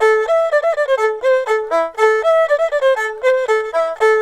ERHU; INIS; Opium

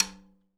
hi tom tap

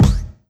Kik Bloop; Funk; One Shot Drums; Sonic Foundry (Sony Creative Software); Synchro Funk

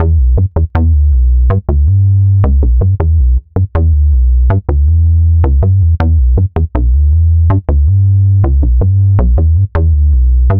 Synth Bass

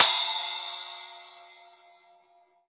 Drums Acoustic, RAW KIT; USB Soundscan vol.10; AKAI; RAW KIT 6

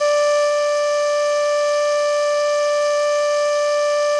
CALLIOPE D3; Sonic Reality; American Heartland

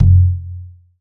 SuBasso Drum; Multisamples; Digital Sound Factory Planet Earth

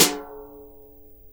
brush, Drums Acoustic; USB Soundscan vol.10; AKAI; 90s sample CD; ALL BRUSH

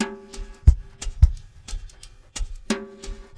SWINGTOMS, Liquid Grooves; Spectrasonics

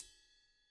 ride, Drum Kits

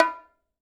HI BONGO OPEN F; Sonic Reality; Interactive Drum Kits

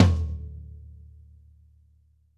tom, drum; 90s sample CD

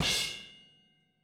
Boogaloo - Outro - Hi-Hat, 82 BPM; Sonic Foundry; Drums from the Big Room The Mixes

